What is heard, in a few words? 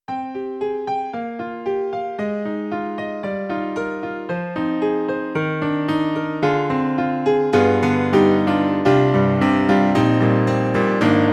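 Perfect Piano; E-MU Sounds of the ZR Expansion ROM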